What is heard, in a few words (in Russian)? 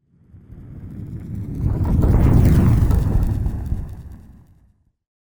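катится огненный шар; Звук огня; Звук огня для монтажа перехода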